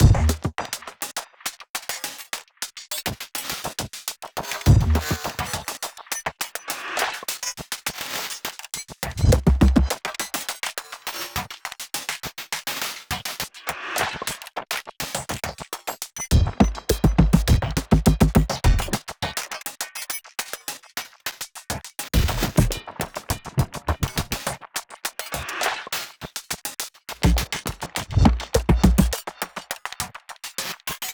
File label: music drums Fight; music ftue drumsFight 01